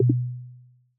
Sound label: Deselect; android-15.0.0 r3; TvSettings